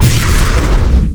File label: booster exp, weapons